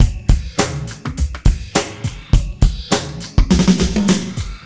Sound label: drum; FUNKACID1